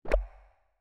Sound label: Bubble